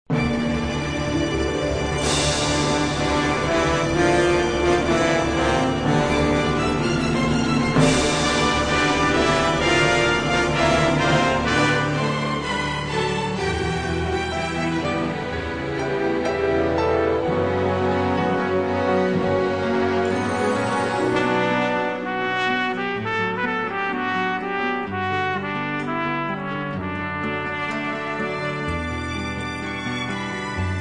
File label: Orchestra, Strings